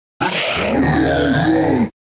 Grito

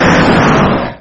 Grito de Kangaskhan

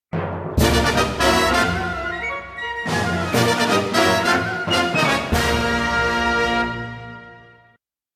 Tema de victoria; Ice Climber; SSBB

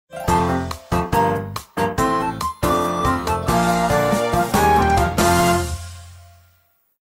Tema de Victoria; Animal Crossing; SSBU

